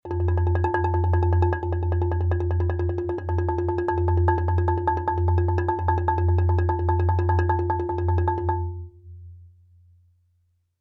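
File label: Marimba roll fortissimo F2; MIS Pitches - 2014; Percussion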